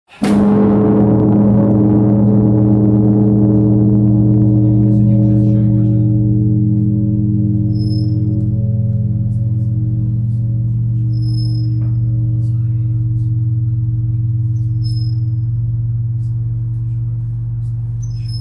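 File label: sound of the Tsar Bell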